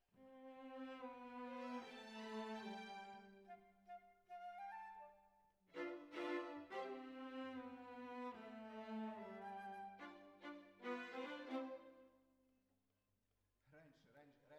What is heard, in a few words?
Violas Over